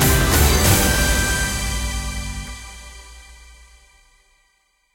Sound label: free spin intro; irish pot luck